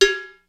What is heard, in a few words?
JD-800 KIT